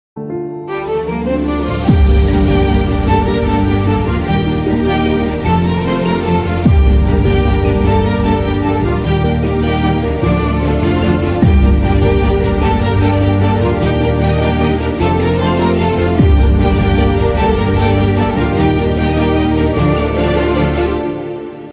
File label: instrumental ringtone beats; villain